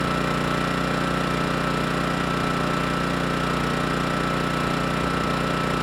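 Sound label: generator loop; aztec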